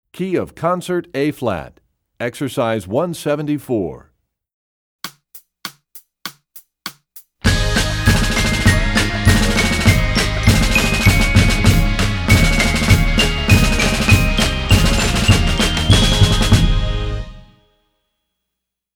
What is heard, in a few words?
Concert Ab, Percussion; Rubank Studies; EE2000 Book2